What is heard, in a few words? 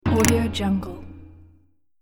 asian button